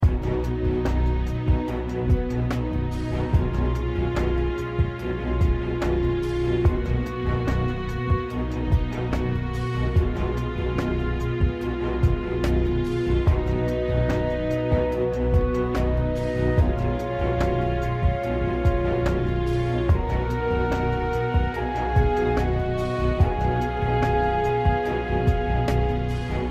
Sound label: Victory Loop, Music; Dungeon Defender; DunDef